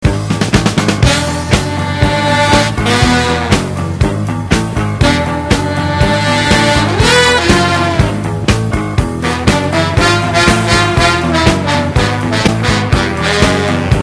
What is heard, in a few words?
blues